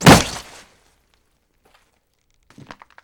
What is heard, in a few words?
Limb and Flesh rip and chewing; RadwinIsland